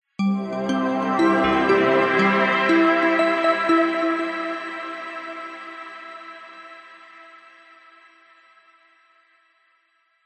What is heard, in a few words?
multimedia musical logo ident warm positive arpeggiated